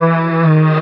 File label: vocal blue E; Slate; AURORA Sample Pack; Vocals